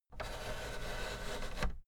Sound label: fader plastic scrape double down slow